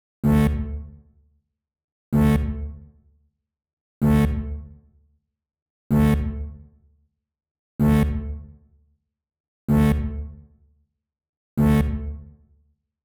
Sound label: 127 G Bass, Complex Electro Synths; Prime Loops; Adversity